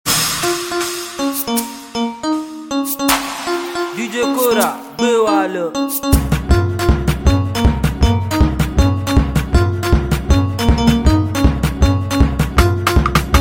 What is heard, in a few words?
Faja Beat